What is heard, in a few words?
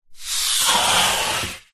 sport surfboard rub hand surface smooth; zapsplat